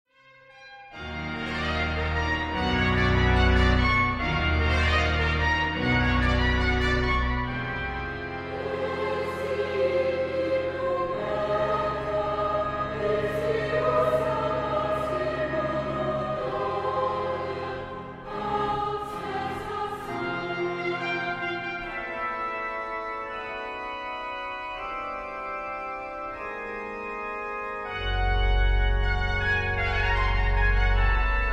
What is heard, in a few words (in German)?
Vier geistliche Lieder; Vis Aeternitatis